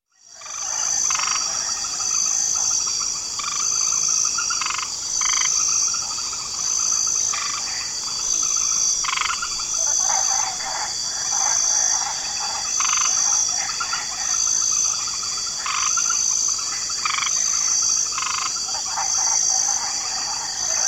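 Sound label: Swamp Ambience Special Mix Light Chorus of Frogs Croaking; Nature Ambiences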